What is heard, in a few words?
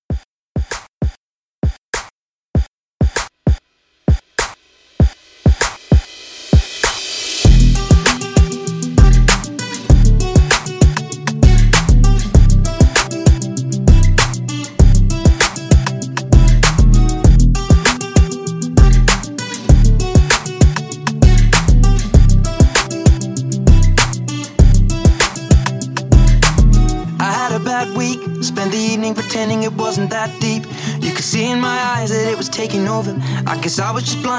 2step 98; Mixshow